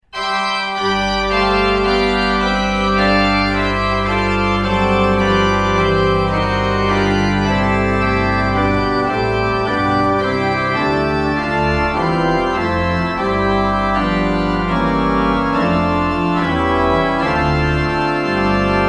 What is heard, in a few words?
Diminuendo